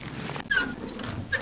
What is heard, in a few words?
glock switch; XDM